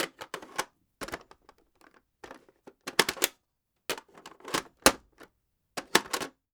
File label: Inserting SNES Cartridge Sequence; Rogue Waves; Super Cart